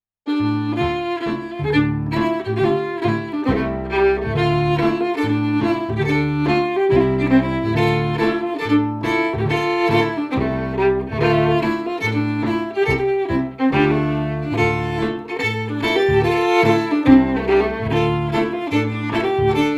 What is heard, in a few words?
FIDDLE PIANO; Jink & Diddle Book o' Tunes 2021